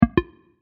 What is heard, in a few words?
Start